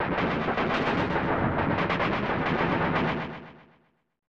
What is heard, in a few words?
Boom!; Kablooey; SNES; JPN Version